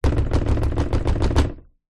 household door front wood knocks fist hard slight rattle